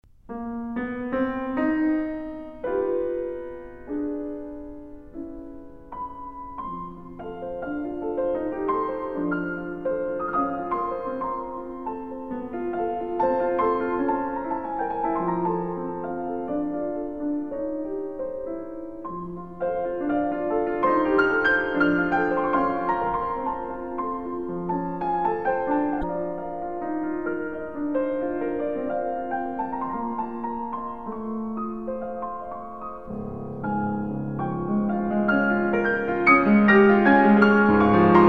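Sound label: piano transcription; Sergey Vasilevich Rahmaninov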